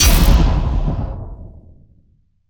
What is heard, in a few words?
crash